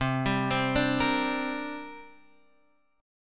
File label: D 7th Suspended 4th Sharp 5th, chord